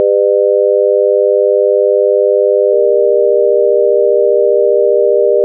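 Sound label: sin, vocoder carrier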